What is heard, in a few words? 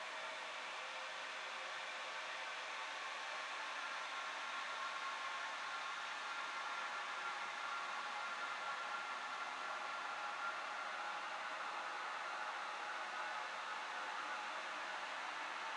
Amb Base Airy-Metallic; viewfinder